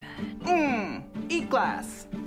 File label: eat glass